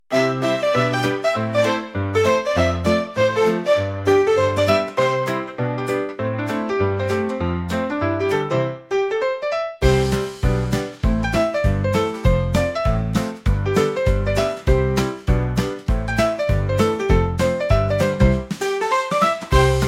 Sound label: instrumental